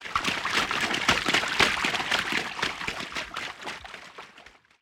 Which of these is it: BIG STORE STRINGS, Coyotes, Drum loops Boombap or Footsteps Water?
Footsteps Water